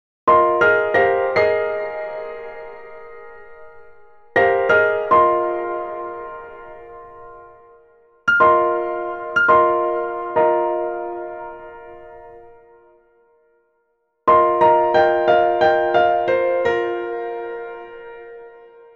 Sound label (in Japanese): wafuu piano